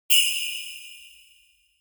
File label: bell